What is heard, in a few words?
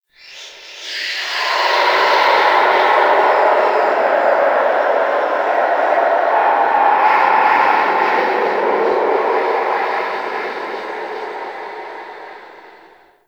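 Grineer Shipyards Distant Cry; Warframe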